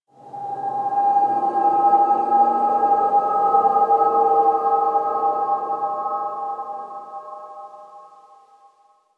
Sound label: Factory Texture, Ambience; Warframe; Quests; TheNewWar; Zariman